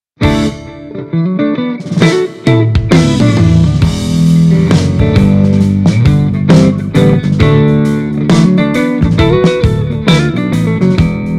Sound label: Stratocaster; Mini Rox Mic Inside; PRIVATE JACK